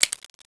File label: reload